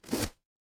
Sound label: paper fabric inventory